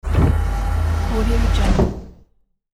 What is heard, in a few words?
space lab door close; hollywoodedge